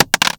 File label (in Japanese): もの落とす